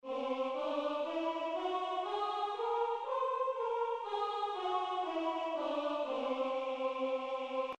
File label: Whole Tone Scale